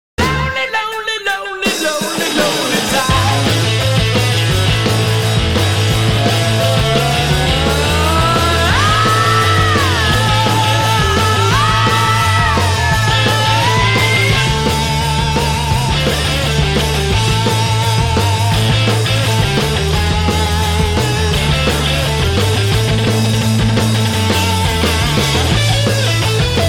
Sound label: Solo Rock And Roll